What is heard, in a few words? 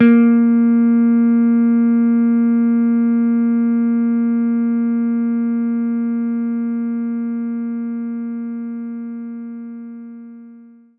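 Jazz Guitar A#3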